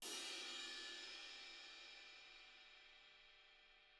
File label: Jazz Ride, Room; Sonic Foundry (Sony Creative Software); Drums from the Big Room The Mixes; Demo Projects